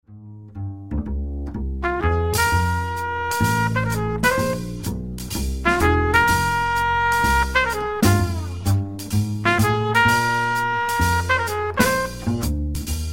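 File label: minus piano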